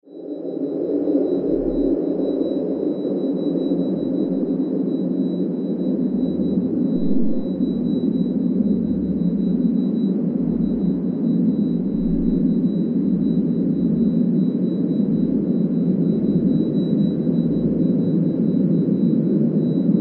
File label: Drone Dark Wind Bell Ring; Blastwave